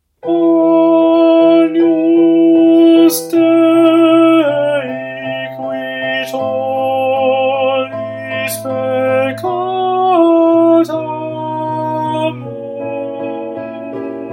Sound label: Agnus Dei Tenor